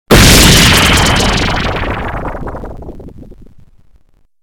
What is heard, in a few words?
beam; termination; wave6-7; patrol chief